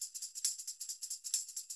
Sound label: TAMB, Percussionworks